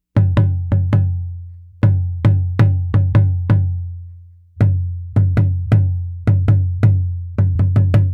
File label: Tambora, African